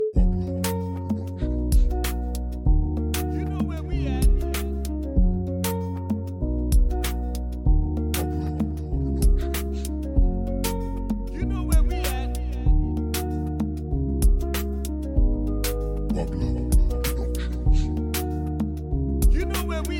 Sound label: Jollof Beat